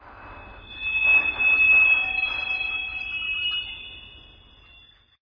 Ambient

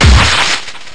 Spark; pokemon reborn e19 2022

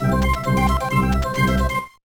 Jingle; Monster Gate; GBA

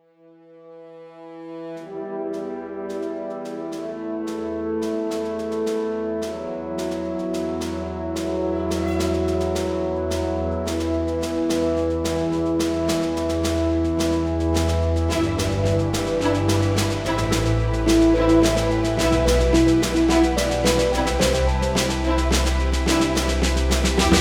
Rising Tension

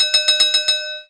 Timer out

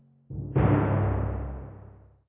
Tymp Hits

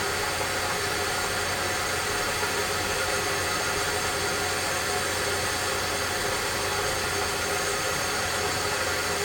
Steam; Link The Unleashed Nexus

